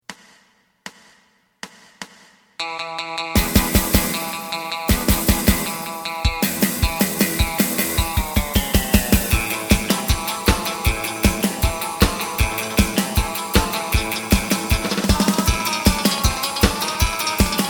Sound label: bass; エレキバンド用カラオケCD